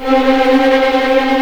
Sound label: C4 TREM, VLNS TREMOLO, String; East West; The Ultimate String Collection